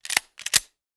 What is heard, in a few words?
gun Cock